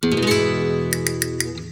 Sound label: Castanets 130; Radius